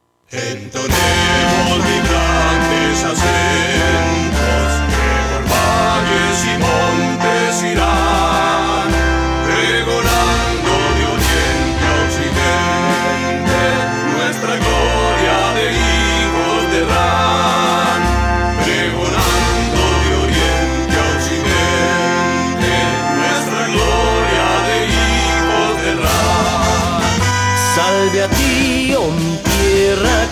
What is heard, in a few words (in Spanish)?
himno de herran